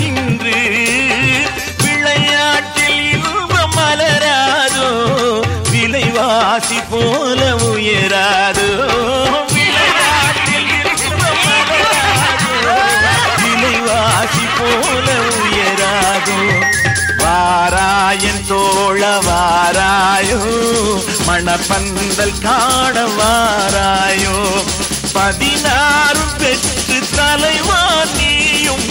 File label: tamil ringtone